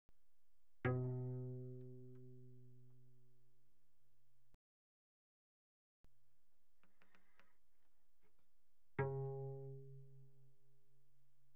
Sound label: Cello pizz mf sul G C3 B3; Strings